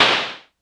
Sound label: Snare Noise Dark; Lost Tapes From Mars